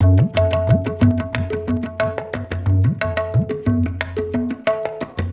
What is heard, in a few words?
Ambi Groove